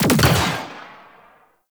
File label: Attack Drone Rifle Fire; Warframe; Venus; Venus Terra Attack Drone; Weapons